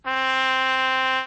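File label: trumpet single close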